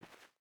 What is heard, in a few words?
footstep barefoot cloth walk; Rust